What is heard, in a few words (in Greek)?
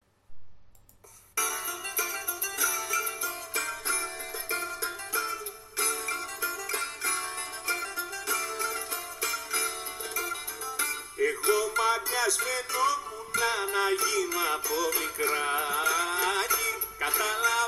tragoudi